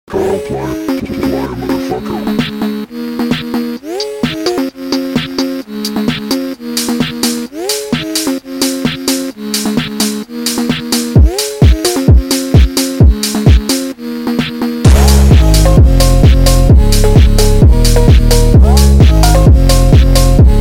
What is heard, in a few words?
Phonk